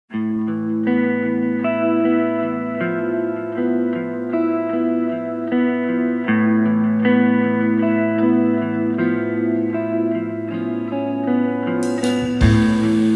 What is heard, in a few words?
trio